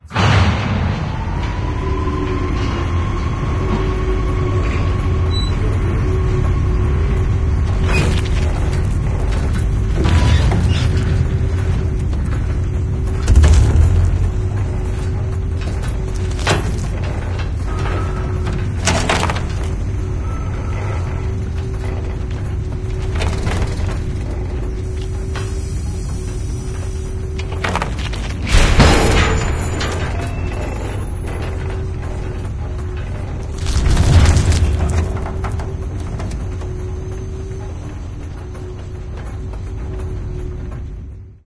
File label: stalker train ride, citadel ambient; source engine; episodic